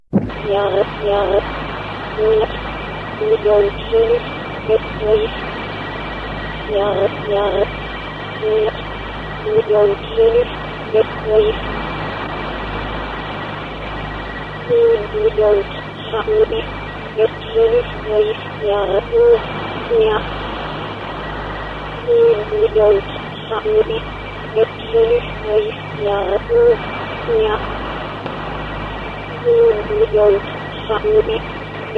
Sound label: random pop reverse